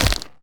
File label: creaking sway; minecraft; mob